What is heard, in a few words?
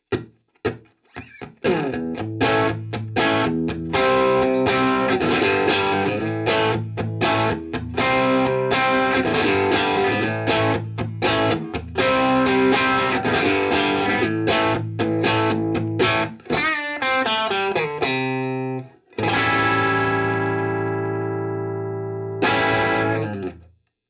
tl drive rear